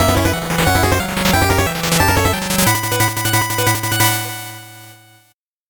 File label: 8bit jingle normal